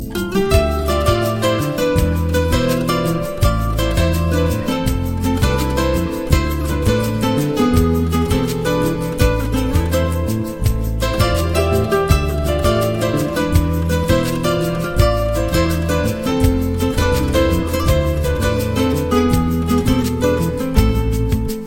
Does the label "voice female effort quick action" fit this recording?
No